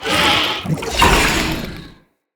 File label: Attack Heavy, Vocals; Enemy; Characters; Corrupted Sproutfolk